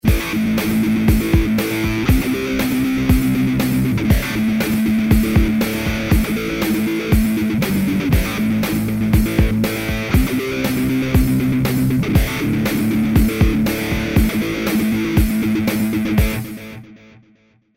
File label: Filter Sequence